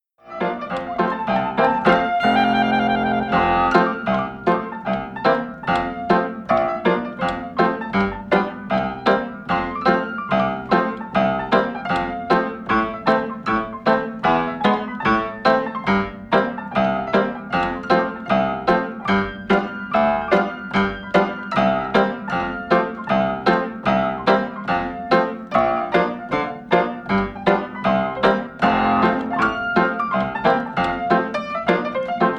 instrumental

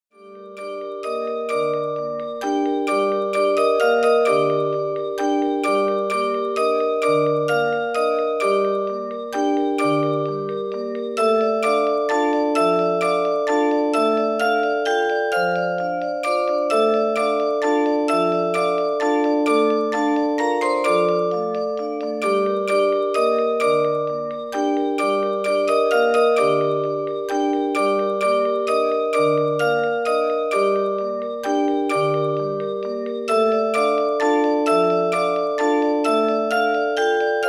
music box melody

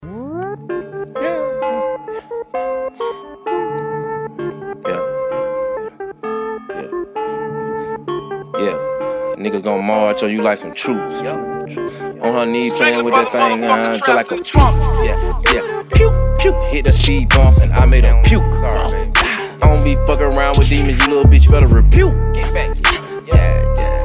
flute

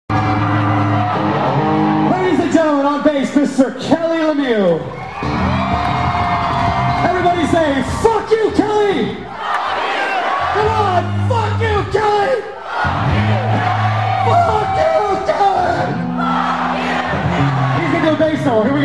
bass solo

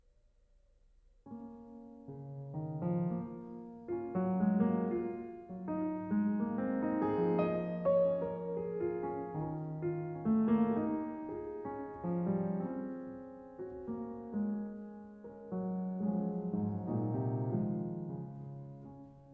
mazurkas piacevole in f sharp major; alexander scriabin; op 40 ii

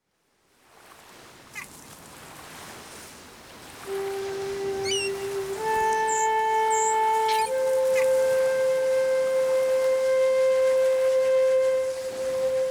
ocean waves and seagulls sounds

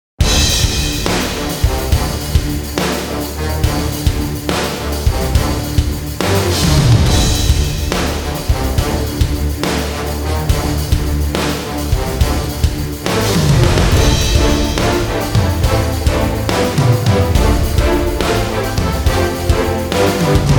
no choir

